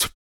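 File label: vox percussion, Vocal Planet Jazz FX; Spectrasonics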